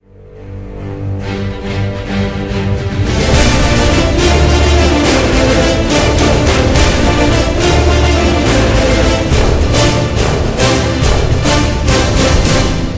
pirate; ze potc fabi

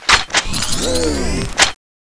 piano gun reload, weapons

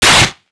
sg552 claw, weapons; buff sg552ex

